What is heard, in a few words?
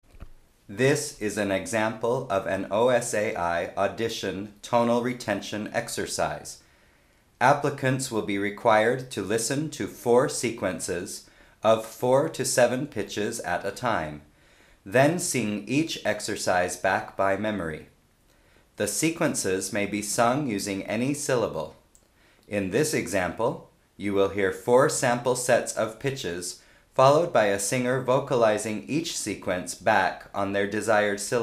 Tenor tonal retention example